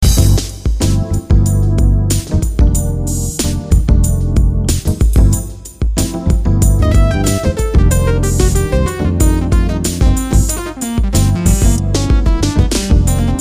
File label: Fusion